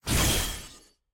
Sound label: skill shield; skill 1; lux